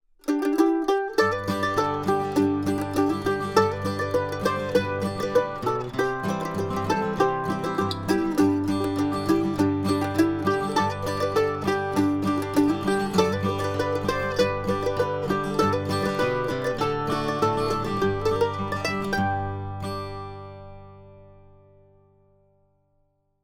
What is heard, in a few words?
mando, mandolin; mandolin lesson